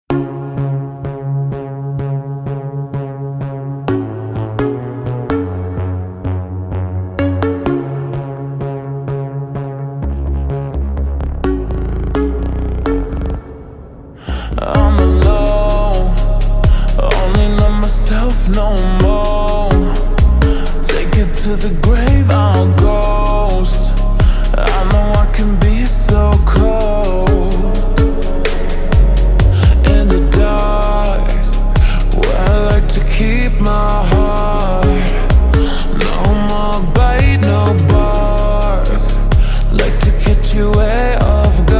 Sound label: slowed reverb